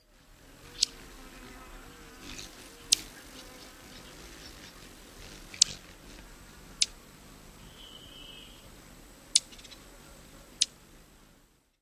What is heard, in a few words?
male calls